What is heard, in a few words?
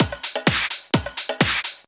Clubhouse Loop 128 BPM